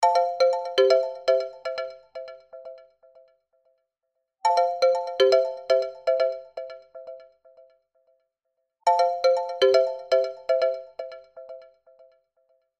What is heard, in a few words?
Bouncer, ringtones; Coolpad; Dazen-X7